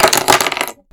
3 Lighters Drop

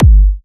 Big Room Kick Punchy

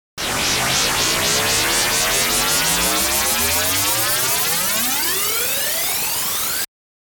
Combed White Wah

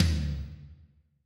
Low Tom

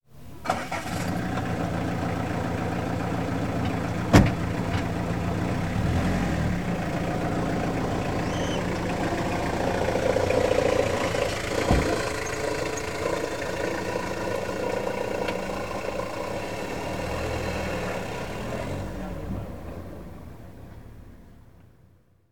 Diesel Car Driving Away

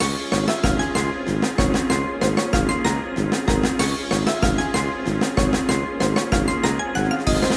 Carnival Game Theme Loop

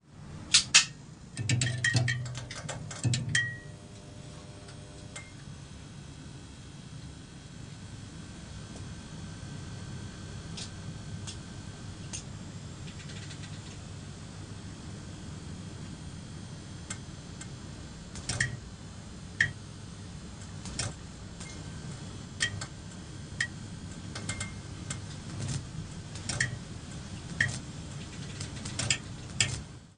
Fluorescent Light Fixture Buzz Clicks Noisy Lofi

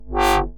Wob

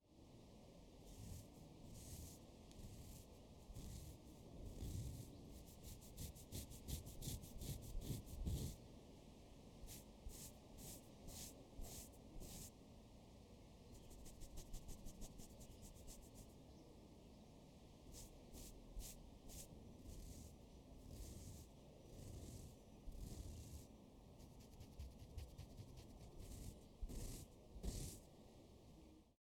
Scratching Skin

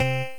Slap Banjo Bass

